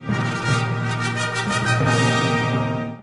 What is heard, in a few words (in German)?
Jingle Fanfare Sendungsstart